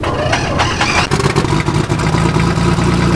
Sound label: fan motor start, airboat; hl2